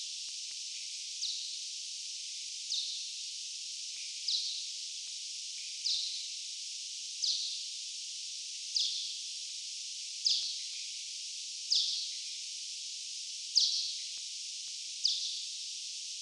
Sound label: M punicus; Corse; M-Barataud; Acoustic Ecology of European Chiroptera